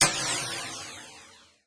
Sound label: Jet Hall, Impulse Responses, Warped Reverb; Medium Spaces; Apple